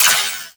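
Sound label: submachine gun Shot; Warframe; Tenno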